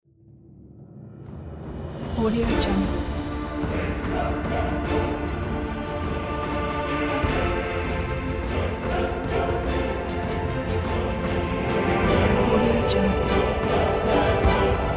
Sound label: mighty heroes epic ident